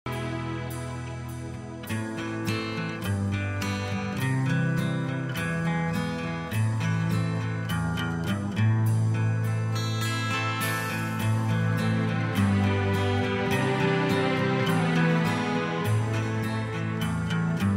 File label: Karaoke